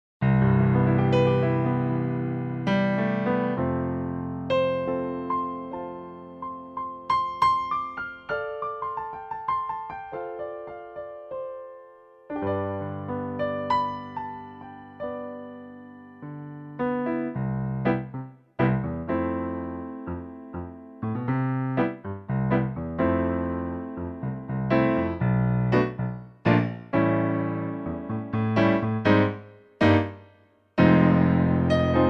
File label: P45 Grand Piano